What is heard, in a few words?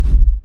whoosh dark